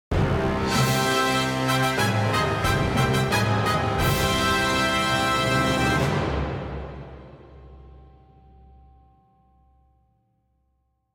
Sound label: Regal Fanfare Stinger